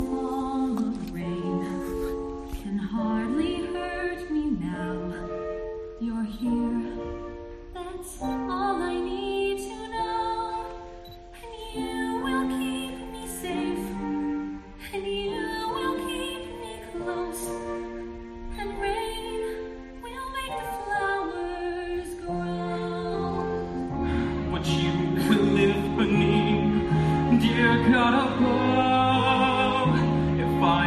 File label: Rain Song